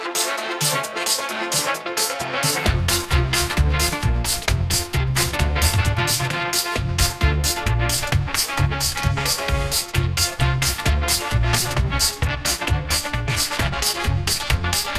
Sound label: musicgen electro swing